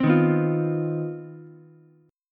C Minor Major 11th C down Ukulele C6; CmM11 strummed; JGuitar

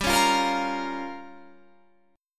G Minor 7th Flat 5th

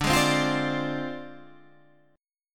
D Minor 7th Flat 5th Guitar Half Step Down

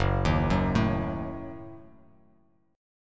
Gb Minor 6th, Bass Standard; arpeggiated; JGuitar

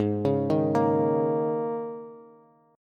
Ab Suspended 2nd Suspended 4th chord, Bass; 16,16,13,15; arpeggiated; JGuitar

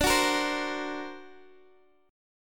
D Augmented 7th Guitar Open G, chord

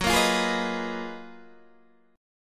F sharp Major 13th Guitar DADGAD

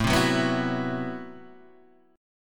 A Minor 6th Add 9th A, Chord; Am6add9 Chord {5 3 4 4 5 2} strummed

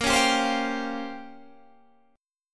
Bb Minor 6th Add 9th Bb down Guitar Open G

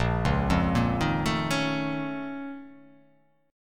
A sharp Minor Major 11th, down

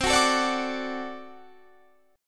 D Major C, guitar

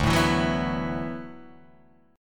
D 6th Add 9th D, Guitar, Open D

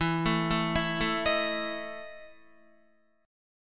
E Major 7th Suspended 4th, Guitar; arpeggiated; JGuitar

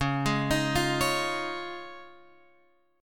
C sharp Major Add 9th C sharp, Guitar Open F; C#add9 arpeggiated; JGuitar

